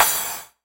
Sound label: crash, Hardcore Breakbeat; Big Fish Audio; Hardcore Breakbeat Action